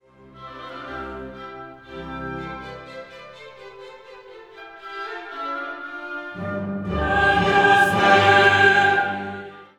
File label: Agnus Dei Just sing trumpet part